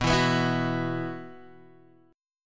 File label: B Suspended 4th, Guitar, Drop D